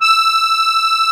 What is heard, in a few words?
HOHNER, akkordeon